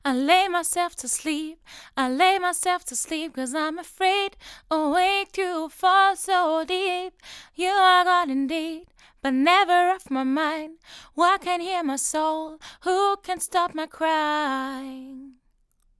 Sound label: vocals; rode nt2a